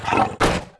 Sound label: attack; Fly For Insanity